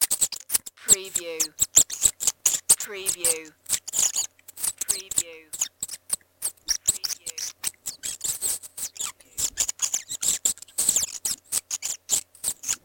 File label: Bats - Few - squeaking